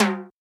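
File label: Hi Timbale; Zoom RT-123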